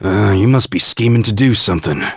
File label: scheming